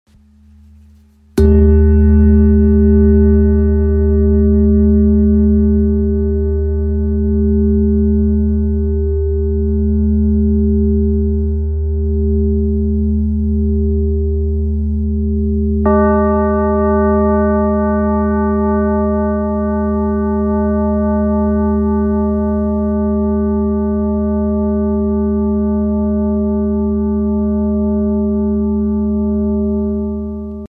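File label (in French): Bol ramgandi mi, bol chantant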